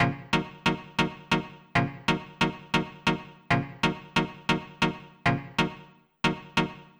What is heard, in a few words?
Stab Riff Eb, Old Skool House, 137bpm; Belfast